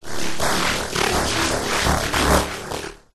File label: science fiction energy power burst; zapsplat